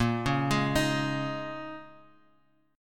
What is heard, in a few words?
Bb Minor 11th Bb down Bass Standard; arpeggiated; JGuitar